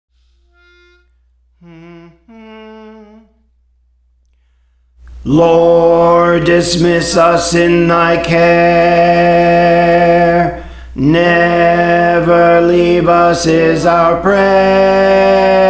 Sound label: Bass; Praise For The Lord Training; Lord Dismiss Us in Thy Care